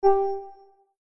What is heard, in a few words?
chime